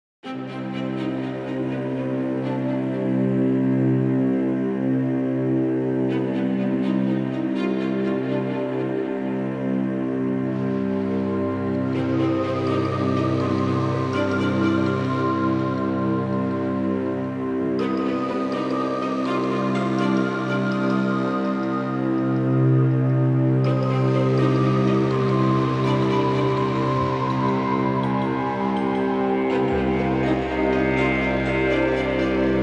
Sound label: Spooky music; Ghost Escape